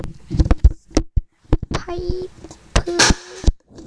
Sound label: pipe